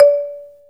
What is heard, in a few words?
MARIMBA C5; AKAI S-Series CD-ROM Sound Library VOL-8